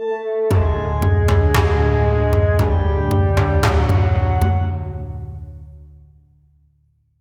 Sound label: Stinger; Witchdoctor Hollide